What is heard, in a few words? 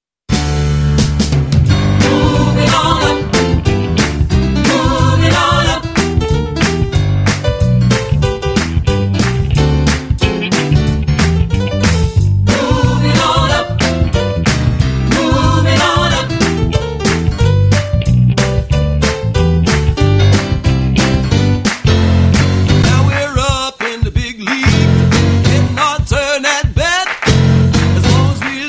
Karaoke